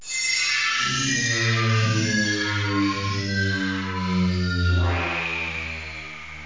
roland d50 intruder fx, synths; Zoom - Release 2 (1996); Active Software

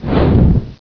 explodes; Plasmorf; Bedlam 2 1.1.1; Bedlam Sounds

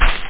acid spit hit; richard sweeney

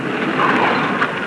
Dig; Starbound II

